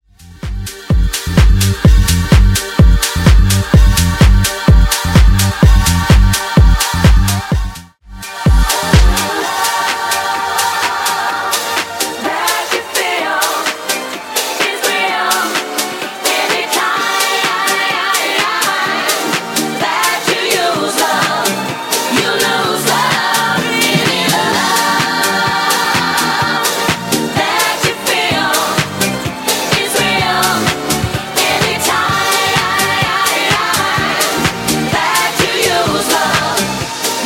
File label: Re Drum 127BPM; Extend